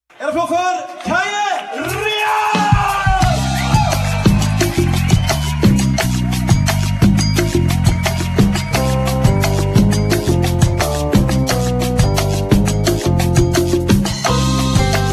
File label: folk